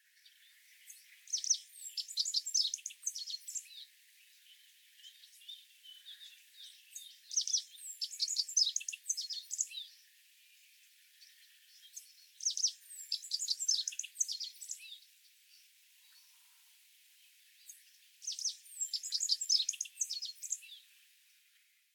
bajszos sármány Emberiza cia

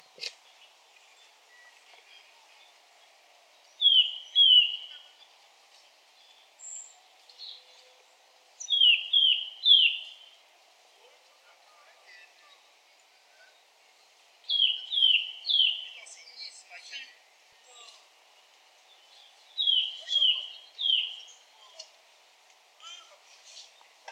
csuszka Sitta europaea

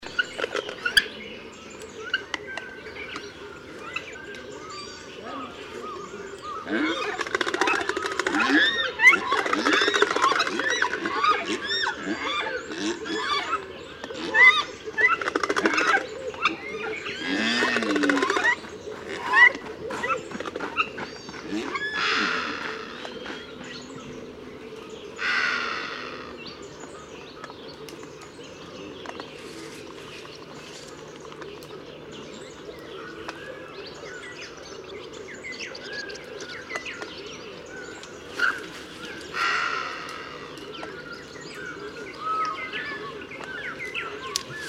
afrikai marabu Leptoptilos crumenifer

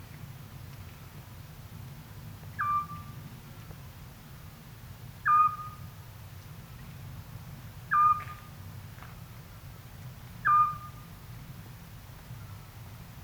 fuleskuvik Otus scops

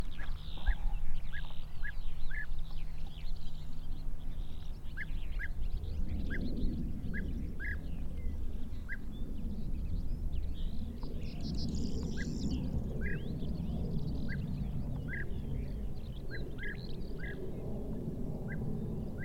gyurgyalag Merops apiaster

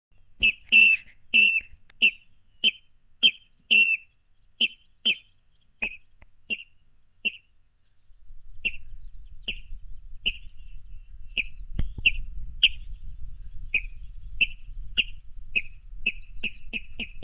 guvat Rallus aquaticus aquaticus

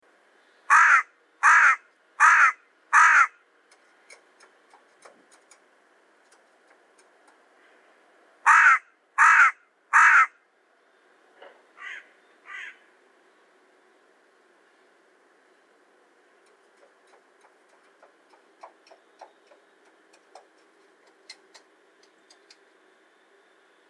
dolmanyos varju Corvus cornix

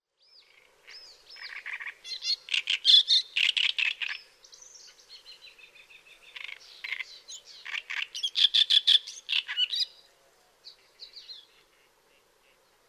nadirigo Acrocephalus arundinaceus

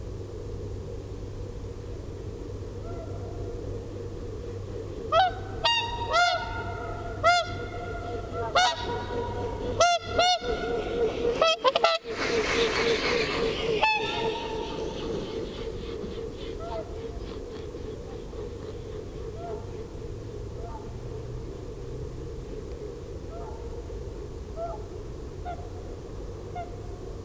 enekes hattyu Cygnus cygnus

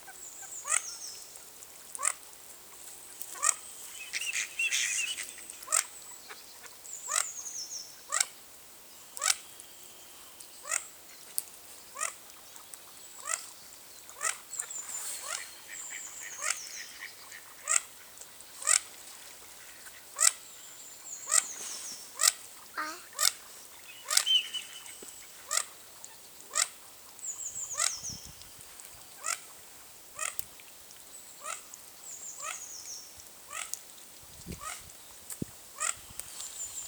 mandarinrece Aix galericulata

